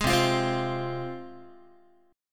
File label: C Suspended 4th Sharp 5th C Guitar; strummed; JGuitar